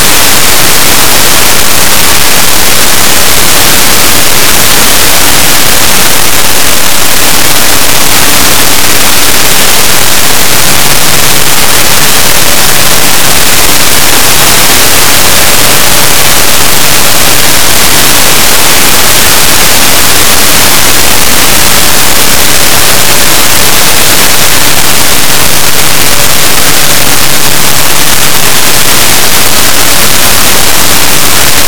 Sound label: noize track n moving bg